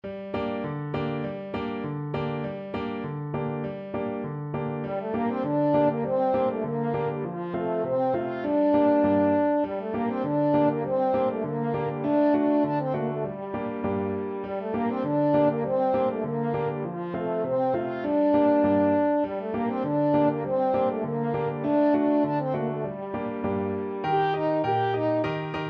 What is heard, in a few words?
french horn